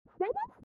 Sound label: click